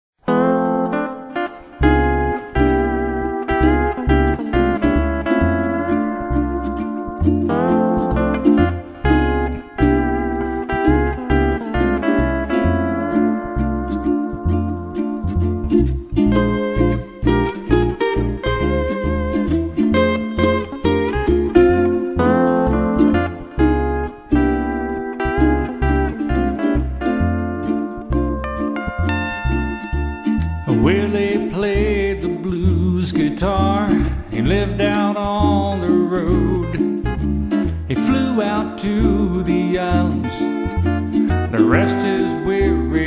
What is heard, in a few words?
Ukulele Blues